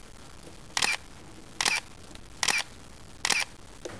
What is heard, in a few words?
Canon EOS 1Ds Mark II